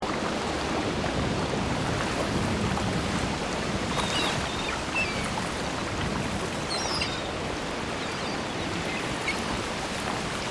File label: Ambient Pirates Day; magic orbz; ios ps3